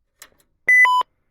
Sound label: nec pc9801vx21; 98tunes